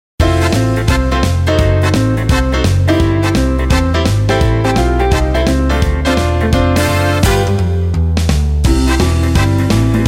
shapes karaoke

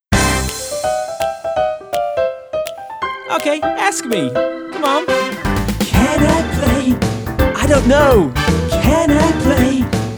song

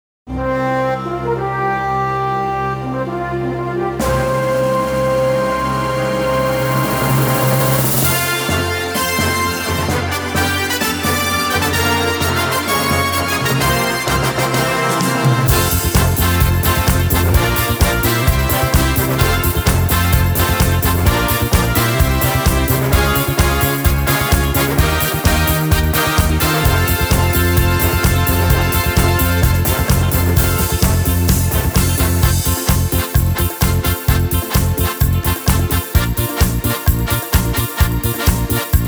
karaoke

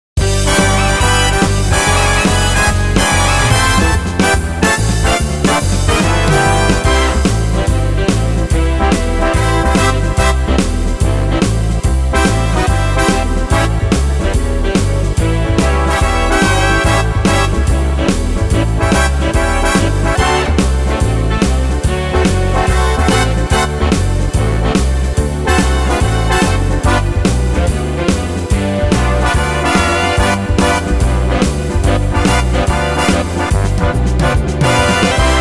karaoke

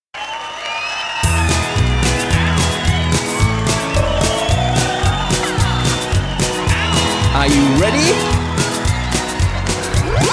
i m thirsty song

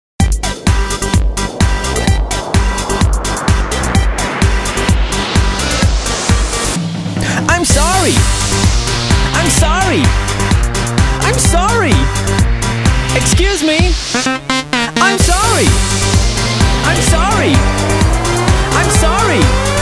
i m sorry song

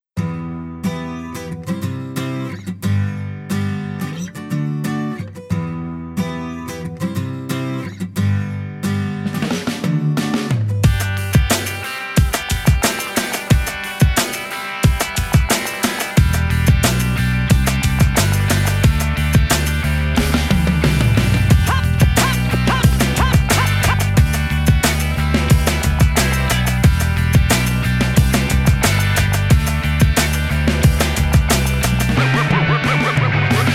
karaoke

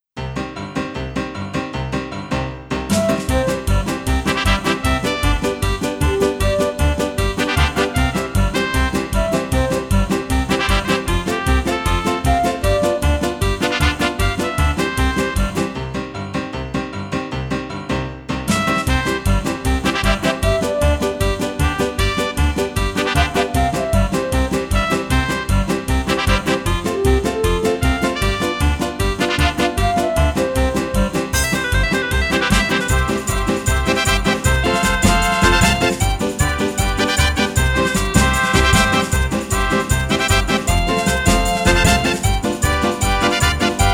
fruit market karaoke

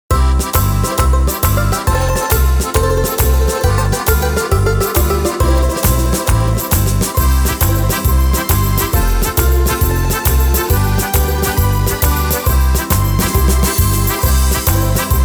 karaoke

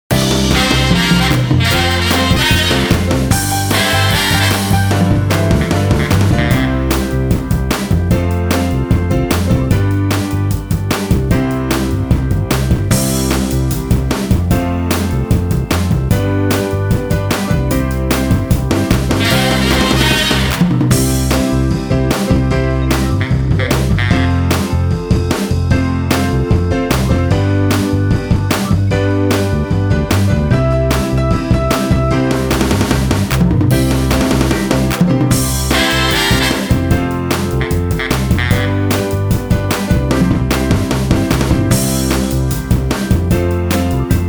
i like vegetables karaoke

